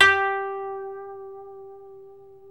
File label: KOTO MILD